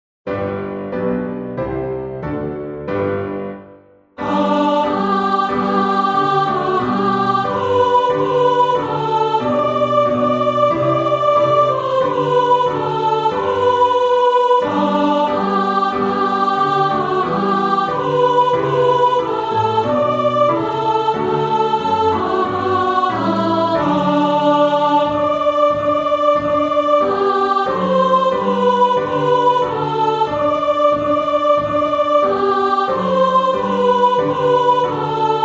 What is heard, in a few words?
Sopran; Mendelssohn